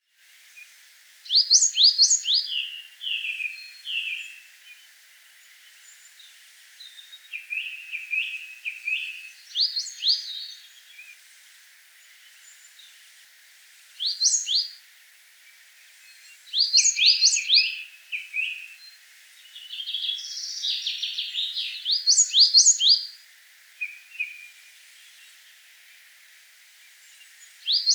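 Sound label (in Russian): moskovka golos, coal tit voice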